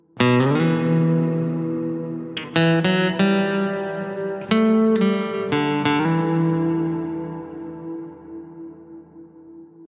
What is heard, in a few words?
Guitar solo